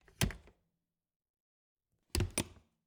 Refridgerator, old fashioned, wooden, steel, brass clasps, hinges, close open, soft; Eiravaein Works; Vaeyan IV